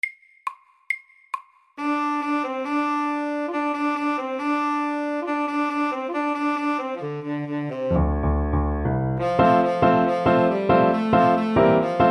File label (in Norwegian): Pentatonisk melodi fort